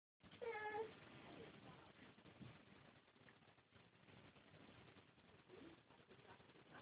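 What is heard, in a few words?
baby hungry, female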